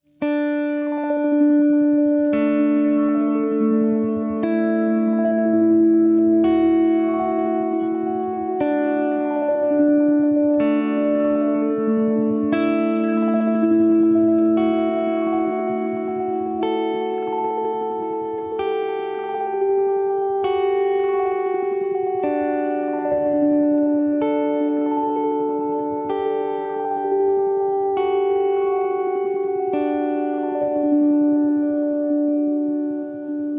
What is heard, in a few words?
ambient; teraecho